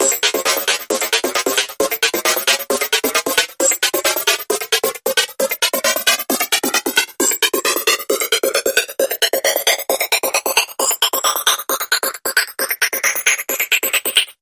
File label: Thin Phased Groove Rise